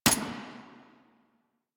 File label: Assault Rifle Mech, Weapons; Warframe; Grineer